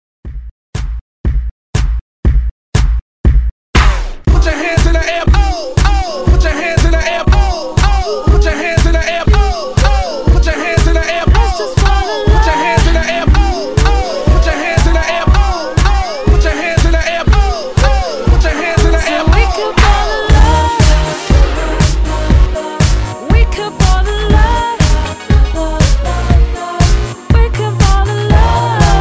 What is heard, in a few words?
Hype Re Drum 120Bpm